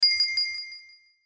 chime metallic bright shimmer; Chimes; Blastwave

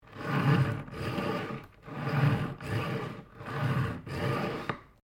KNIFE SHARPENER USE SEQUENCE; Various knives; Kitchen; Household

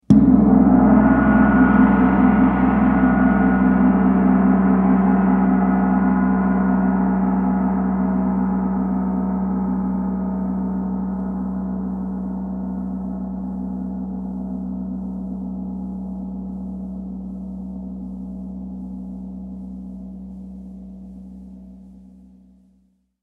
Gong Large Single Long; Gongs; Musical

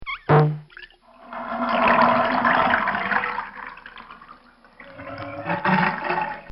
Zip Bang Plop; Funny Sounds; Comcial Sound Series

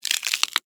Finger Break Bone, Horror; Bone, Slow; Blood and Guts; BlastWave

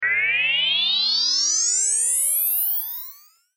Sci Fi Device Electronic Warm Up High Pitch; Control Panel

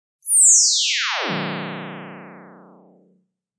Long Zap; Zaps

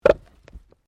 Wood Log Place; Material components for constructing more complex sounds; Materials

